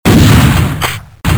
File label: Bazooka; bruitage; Armes